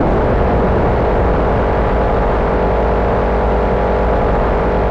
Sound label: minigun R fire IN; Weapons; DCS